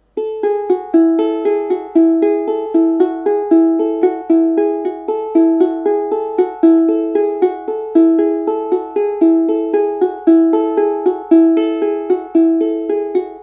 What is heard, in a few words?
Plain Hunt Fast, ukulele